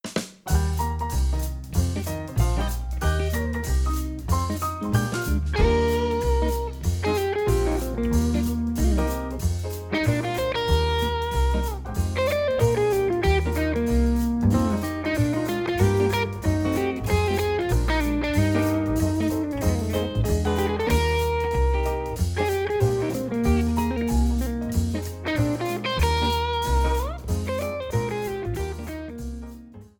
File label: Flower Field BGM Jazz Arrangement